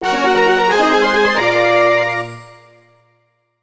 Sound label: (Jingle) Stage Clear; Dr. Mario Online Rx; Level 10